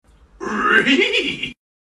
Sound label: the best notification ringtone